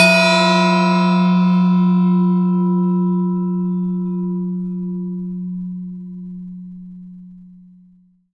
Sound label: Clang